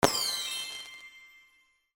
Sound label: confetti SFX